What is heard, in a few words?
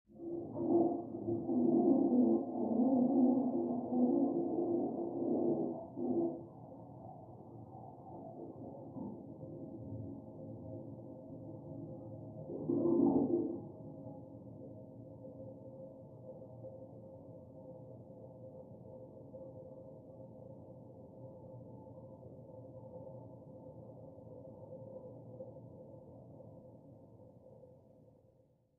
Toilet Flush Water, pipes